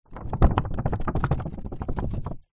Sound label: card birthday shake woble